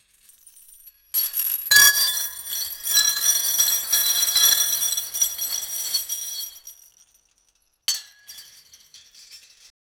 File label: metal chain rattle hammer